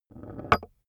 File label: small metal rolling on wood stop; industrie wirtschaft; metall